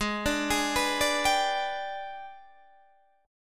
Ab Minor Major 7th Flat 5th, down, Guitar, Standard; AbmM7b5; arpeggiated